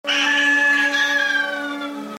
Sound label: hoooogan